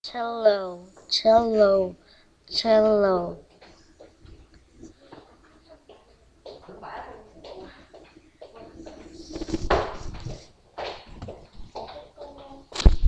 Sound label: cello